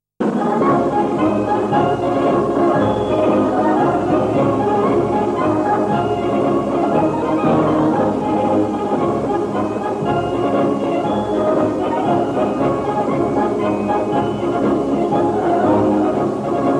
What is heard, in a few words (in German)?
militarmarsche der luftwaffen marsch; deutsche militarmarsche